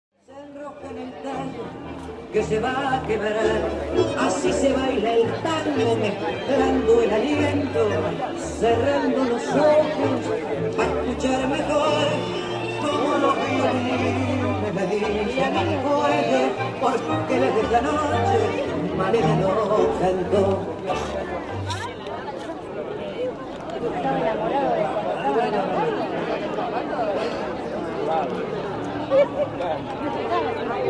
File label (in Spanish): milonga del playon; plazas montenegro